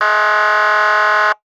Emergency Siren Police Motorcycle Air Horn Long Single; emergency effects; AdobeCC